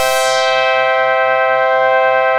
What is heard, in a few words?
BOLERO DR, Synth and Organ